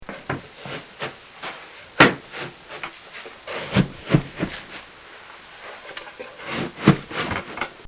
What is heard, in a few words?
Scooping Grain